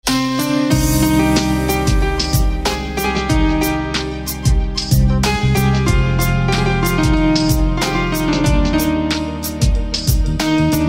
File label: Piano